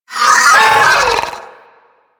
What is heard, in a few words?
Sentient Vocal Pain, Enemies; Warframe; DE; Sentients; Ortholyst